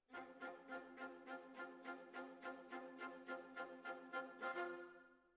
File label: Violas Over